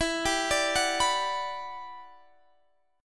E Minor 9th, down

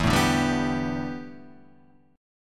A 9th Suspended 4th E

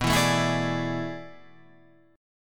A Major 7th Suspended 2nd Suspended 4th

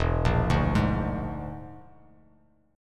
E 7th Flat 5th, down; E7b5 arpeggiated; JGuitar

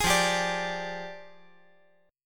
F Minor 6th Add 9th F down

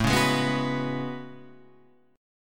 A Major 13th, down Guitar Half Step Down; AM13

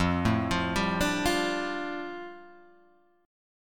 F 6th Add 9th F, chord

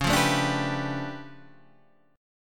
D Minor Major 13th, C sharp

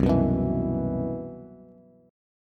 C Major 7th Flat 5th C, Bass; CM7b5 strummed; JGuitar

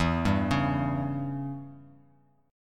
E Minor 6th E; Em6 arpeggiated; JGuitar

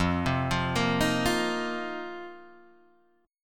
F 7th Suspended 4th, down, Guitar, Standard; arpeggiated; JGuitar

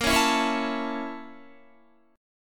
A sharp Minor Major 7th Flat 5th A sharp Guitar; A#mM7b5 strummed; JGuitar

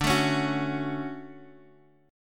D-Major 7th, D, Guitar, Open D

